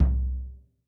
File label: bassdrum oh, gretsch kit